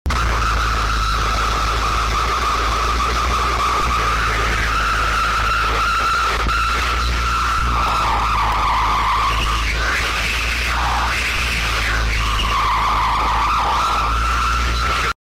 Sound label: screech